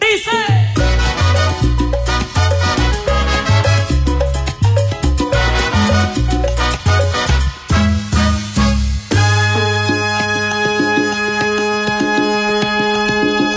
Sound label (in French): Chanson latino